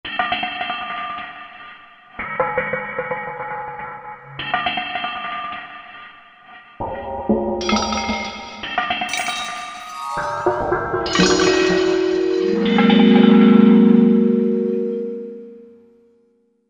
Velo Spin, Korg MSC-14S Sound Effects Combinations